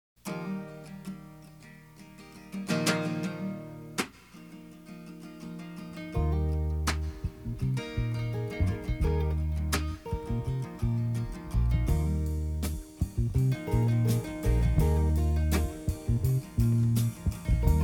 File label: music